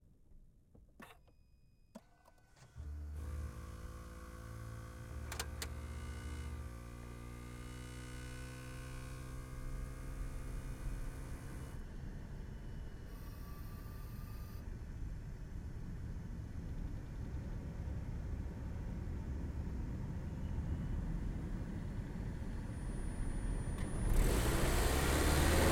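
Volvo, XC90, Hybrid, Onboard, Medium, Drive, Various, Engine; 2018 Electric; VP88; Pole Position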